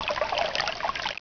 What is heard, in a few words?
SPLASH; Warlord3